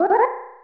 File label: Sword Inside Charged; Weapons; Open Zelda; Quest Designer 2.0.4b